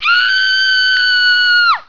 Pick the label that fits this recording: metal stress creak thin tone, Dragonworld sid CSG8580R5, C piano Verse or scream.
scream